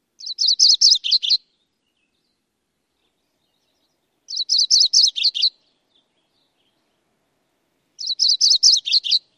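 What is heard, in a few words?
songs, bird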